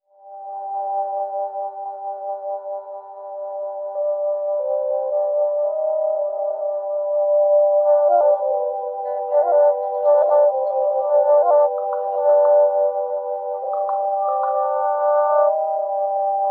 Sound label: flute domination